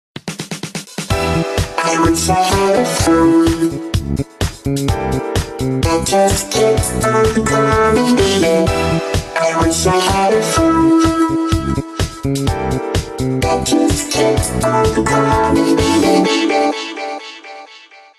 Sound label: disco; sony ericsson; ringtone